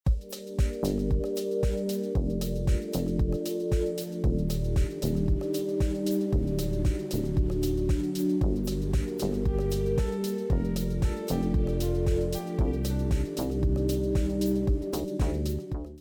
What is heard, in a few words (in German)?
musik Star Groove; Telefonansage Musik